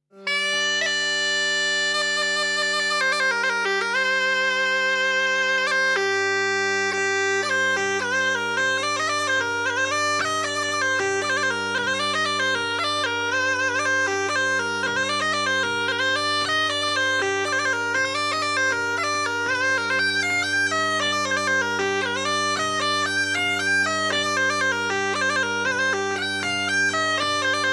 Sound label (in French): Bourree d un violonaire